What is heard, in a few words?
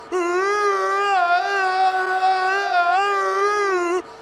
uueeeeuuhhaahuahhuuahheeeeuh; the rock